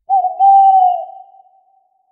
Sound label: workspace complete